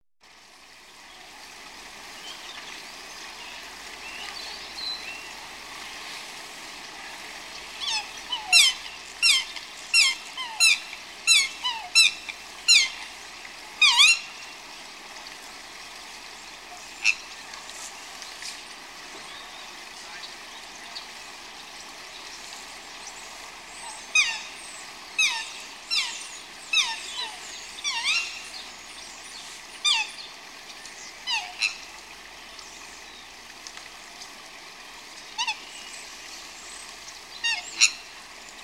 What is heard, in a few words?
Birds tweeting and chirping by the river